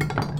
bonker

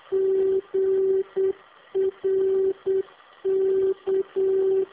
morse

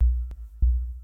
d kikk dubbl

